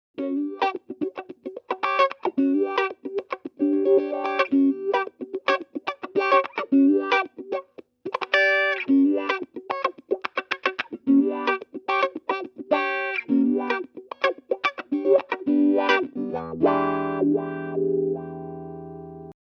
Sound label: phunky